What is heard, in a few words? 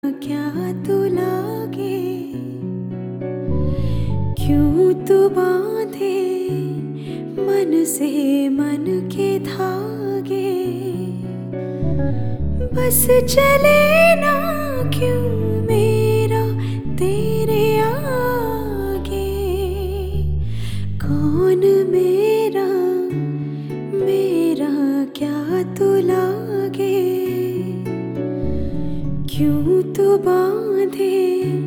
Female; Bollywood; Special Chabbis